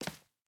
Step Heel Marble; Game rip; MiSide

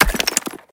Break Shot; Game rip; MiSide

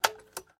Coffee Pack Open; MiSide

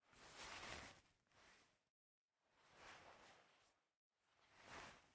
Take Flash; Game rip; MiSide

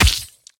Creepy Hit; game audio; MitaCreepy; MiSide

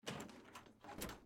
Open Door Basement Lock; Game rip; MiSide

